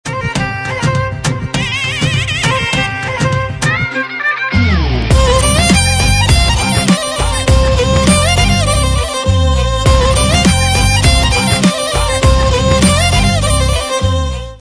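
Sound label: Promo background music ringtone; Acharya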